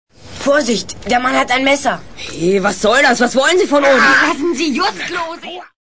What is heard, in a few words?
aargh